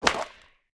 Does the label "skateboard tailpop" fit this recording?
Yes